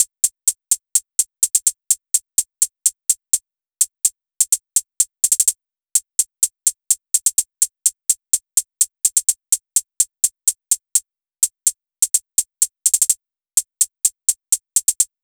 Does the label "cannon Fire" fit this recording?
No